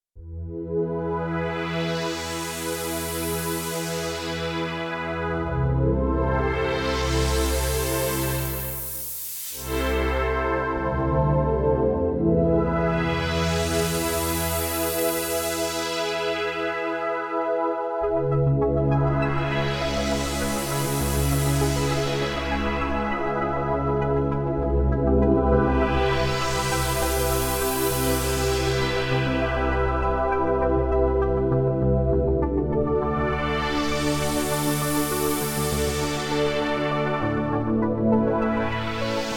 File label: Sweep Up Pad, Korg Radias-R